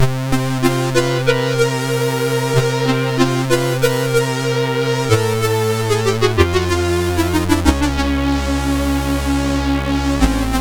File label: sid MOS6581R2